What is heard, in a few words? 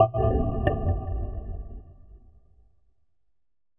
Blub; Sonic Foundry (Sony Creative Software) - Future Retro Dance Excursions